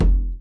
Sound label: acoustic bass perc; Masterbits CLIMAX 7 - Bass